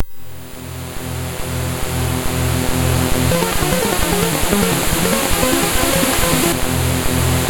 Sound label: Short c64 tune, sid MOS6581R3